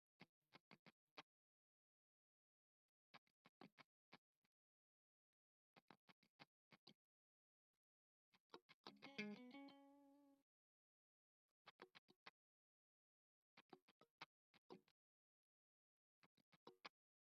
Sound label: GTR Heavy